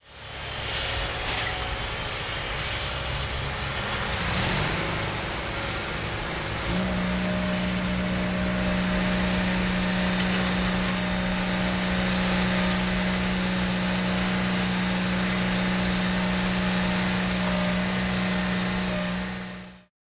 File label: Hamburg Crane